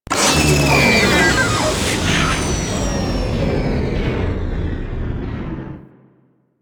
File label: Reactor Deactivate; Warframe; Ambience; Corpus Gas City; Gameplay Remaster